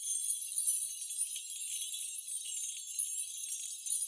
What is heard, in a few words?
Magic Underway Loop